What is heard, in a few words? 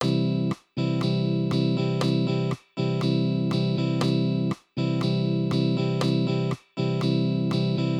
Strumming patterns, guitar